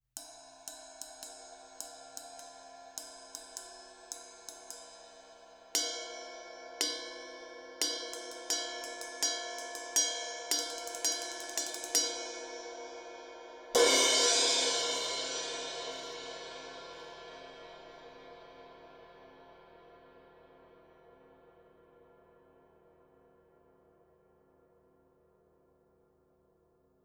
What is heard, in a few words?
Cymb Ride; Close Edge